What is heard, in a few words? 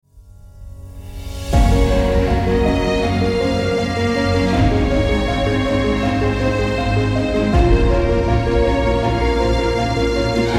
Piano And Cello